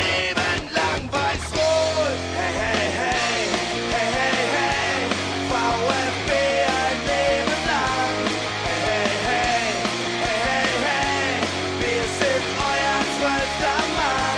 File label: ringtone; vfb